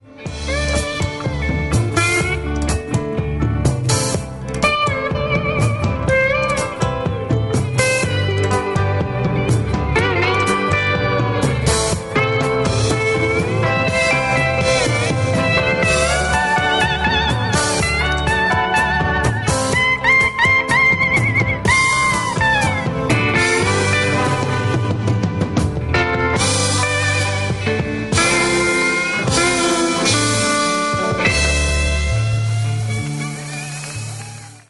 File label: Fender Tele, guitar; Rockpalast 1979